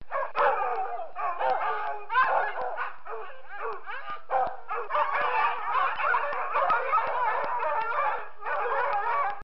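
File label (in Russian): полицейская собака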